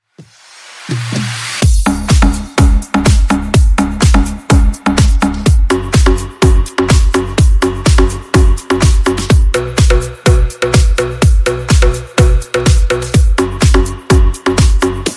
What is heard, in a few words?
Funk Remix 125 Bpm